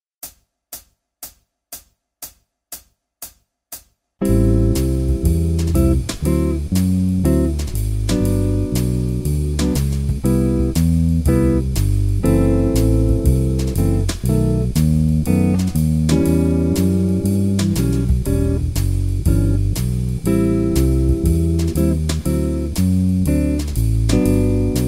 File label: 120 bpm Backing track